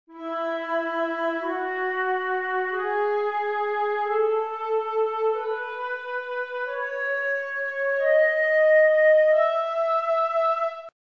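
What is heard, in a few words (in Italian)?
mi maggiore, scala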